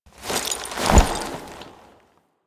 Horse Foley Mount; Foley Actions; Warframe; Vehicles; Duviri

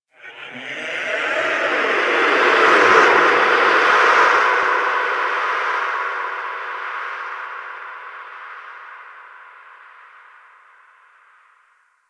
Chamber Whisper; Orokin; Warframe; Sacrifice

